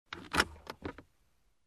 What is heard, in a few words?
Releasing seat belt